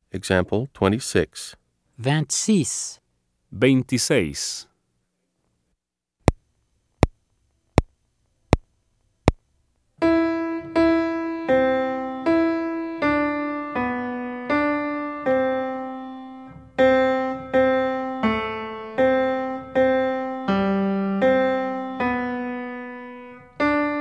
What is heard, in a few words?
Keyboard